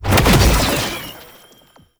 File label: Lunge Cast; Warframe; Oraxia